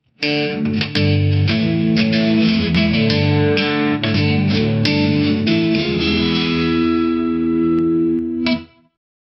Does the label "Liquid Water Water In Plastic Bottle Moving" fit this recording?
No